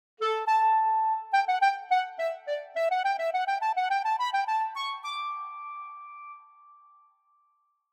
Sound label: Clarinet; Apps for the Fields